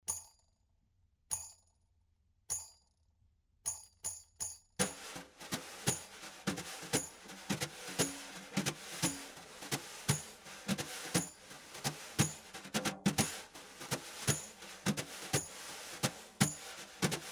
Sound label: body drum